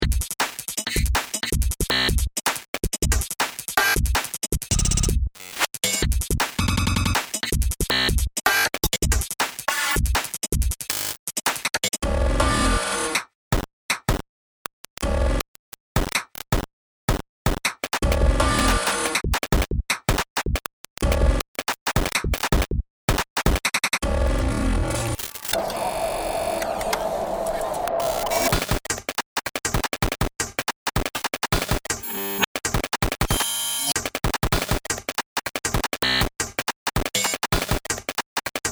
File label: kit